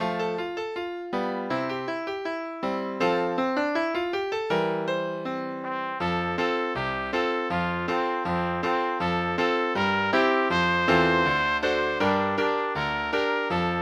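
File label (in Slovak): pre trúbku a klavír; Pieseň o jedličke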